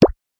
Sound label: squish; minecraft